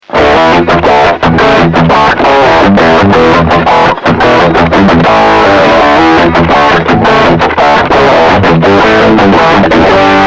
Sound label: Tech 21 Sansamp Classic Lead